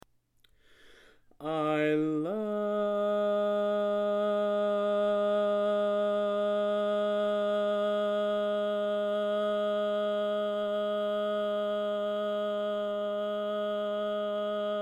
Bass, Barbershop Tags

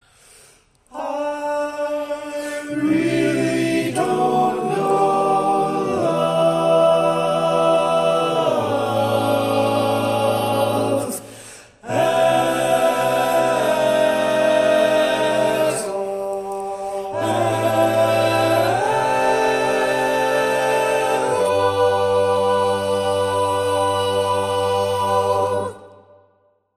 Tenor

